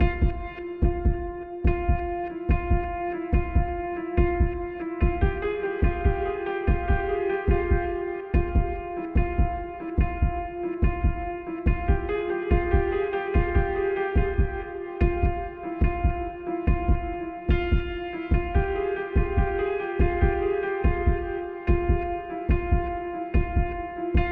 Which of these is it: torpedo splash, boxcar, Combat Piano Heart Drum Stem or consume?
Combat Piano Heart Drum Stem